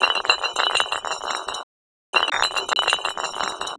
Resynth Glass Roll

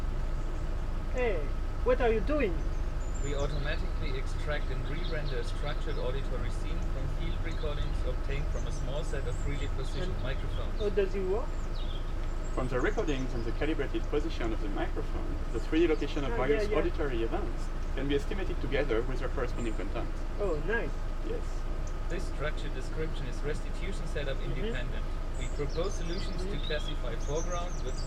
mono Outside

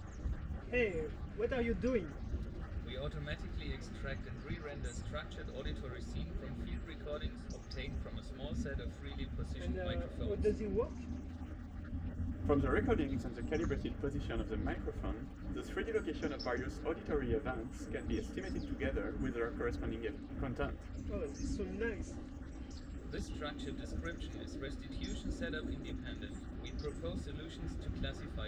Outside

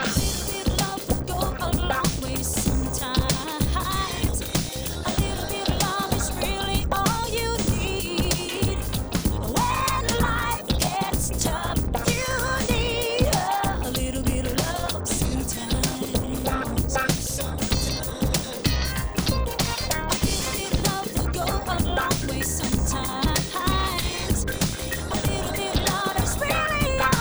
musicmix

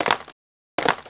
Resynth Wood Break

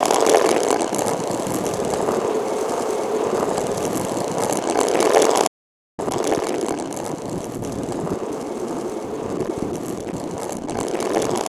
Stone Roll; Resynth

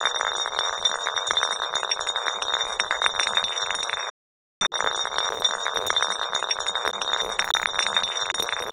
Resynth Glass Roll

